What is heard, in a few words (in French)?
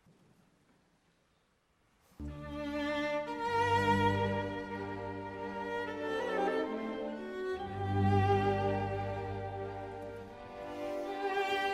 Alto